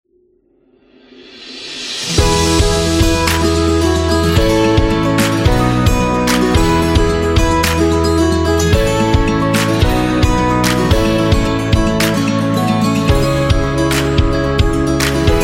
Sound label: Happy Folk